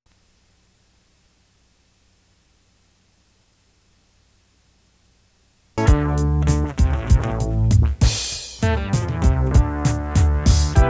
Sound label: strictly rhythm